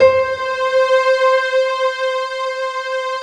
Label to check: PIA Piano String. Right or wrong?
Right